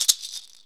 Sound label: MARACAS; AMG - Vince Clarke Lucky Bastard